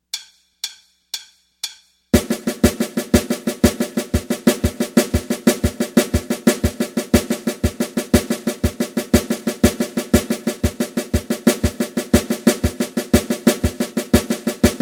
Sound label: Bar Exercise, Jazz Drumset